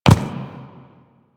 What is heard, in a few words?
Ram Step Run Dirt; Animals; DE; Warframe; Quests; Duviri